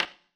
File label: surface wood table, dice; darkwatch